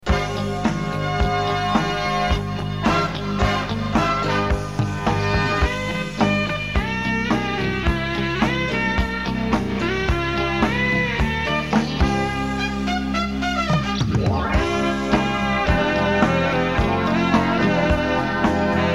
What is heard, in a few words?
RB Instrumental; WCCO AM